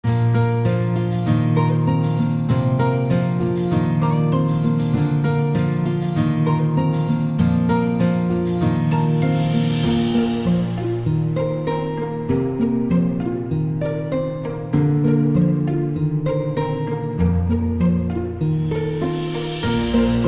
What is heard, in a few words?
stepping stones no drums